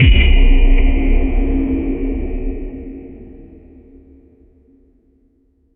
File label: a resonant battle buff so, magical energy